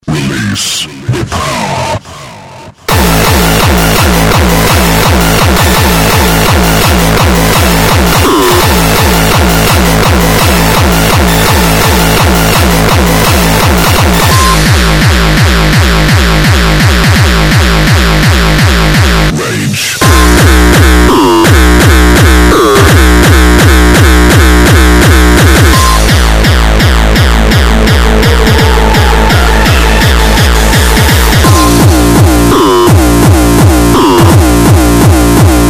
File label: hardcore